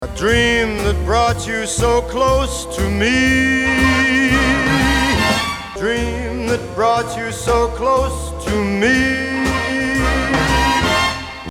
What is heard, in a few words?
Trumpet Clam; Furmanek; MFSL